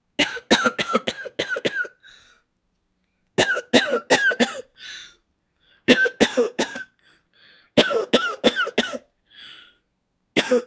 the sound of an elderly

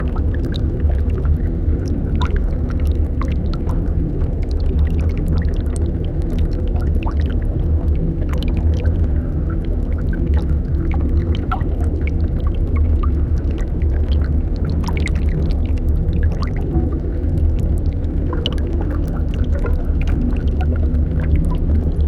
a soundscape of wooden oars

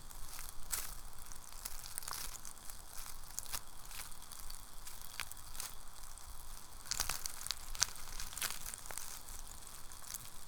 asmr of seed growth seed

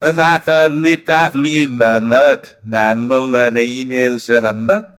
i need a song jingle

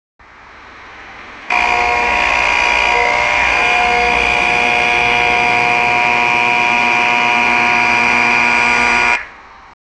police and hockey buzzer

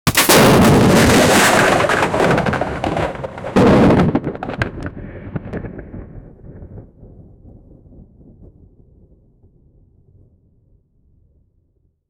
the sound of a 120mm, explosives

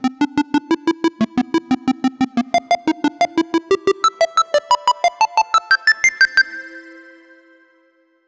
a short monophonic melody, 8 bit

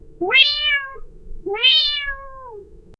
the cats dialogue

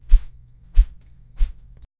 axe waving in the air; melee

